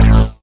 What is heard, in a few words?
Synth Hit A